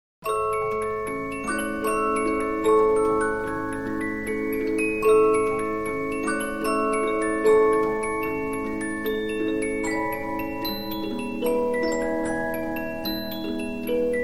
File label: Polyphon, 15inch disc musicbox